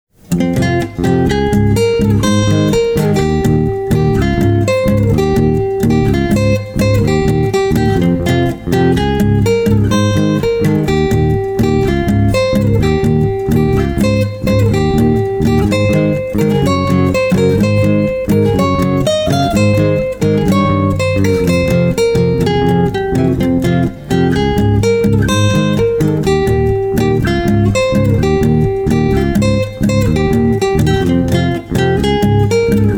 Organ; legend mana

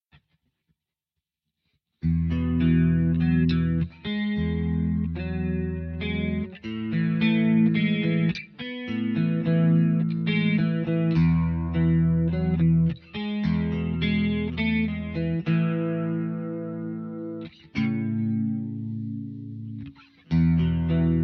Guitar Cover; Snailiad

